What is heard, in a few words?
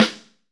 SN, Snare; AKAI S6000 CD-ROM - Volume 4